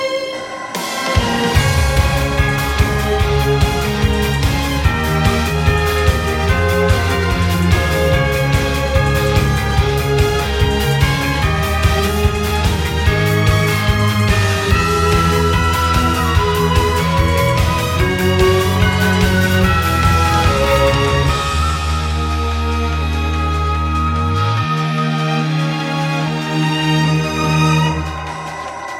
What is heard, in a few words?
Quintet